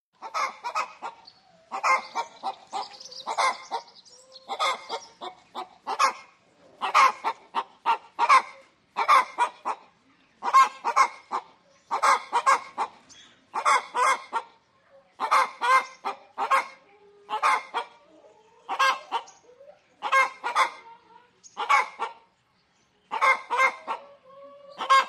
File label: Hen Cackling After La; chickens